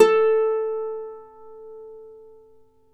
Celtic Harp, Plucked Strings

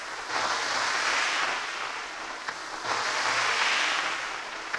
Cracklature, sparse soundscape

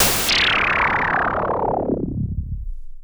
Pulse, Filtered Noise

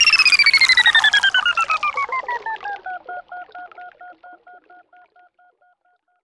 Mobile Phone; Roland BK-5

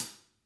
hat, Vintage Timetraveler kits; Sonic Reality - Vintage Timetraveler

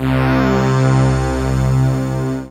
Dance Mega Synth; Best Service

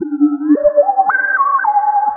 Quasar Whistle 110BPM; sci-fi; Loops

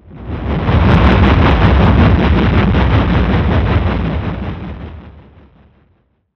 FX TRAIN; Big Beat Samples & More; AKAI; DISTOTHER KT

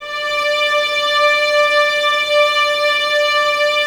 VNS D 5, Violins; 23 Violins; String Ensembles; Miroslav Vitous

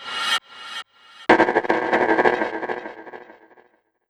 CREEPY; Big Fish Audio; Uncivilised G'rooves

